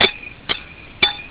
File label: anvil; openrsc